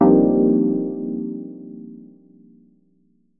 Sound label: stab satisfying; Melodic Stabs and Hits